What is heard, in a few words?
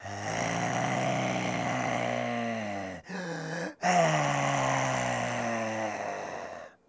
Creature Growl Low